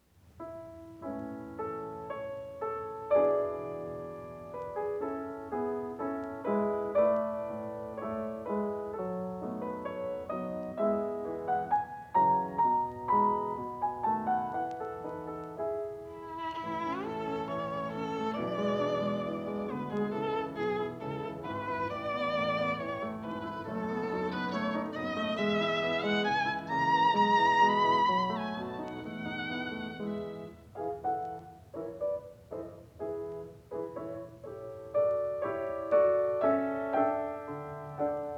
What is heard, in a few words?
Sonata for Piano and Violin in D major, Tema con variazioni; Sonata for Piano and Violin No. 1 in D major Op. 12/1; Beethoven